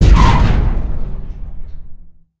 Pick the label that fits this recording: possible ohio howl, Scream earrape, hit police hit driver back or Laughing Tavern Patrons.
hit police hit driver back